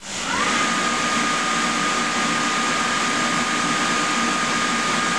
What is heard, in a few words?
Compressor SL20 Begin